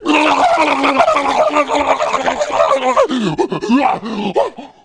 electrocute; Messiah

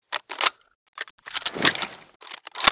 kar reload; weapons; foley; Spearhead Demo

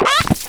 chicken; fun and bullets

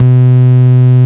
Acidic Bass C2; BassHits

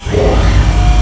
door; aggress

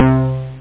fat brass

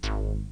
Sound klunk

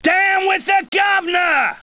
Rioter; CAESAR3

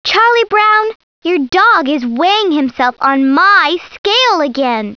scale

